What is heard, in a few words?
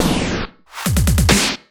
Fills, Loops 140 BPM